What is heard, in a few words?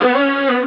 vocal blaze B; Slate; AURORA Sample Pack; Vocals